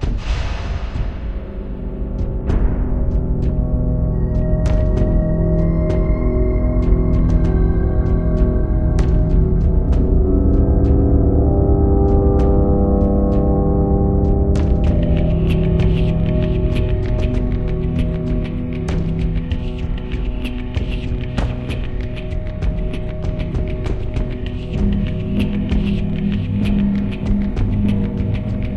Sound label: Ambient (Underworld)